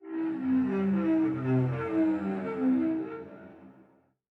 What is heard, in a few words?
Cello Slide Down; SoundsCrate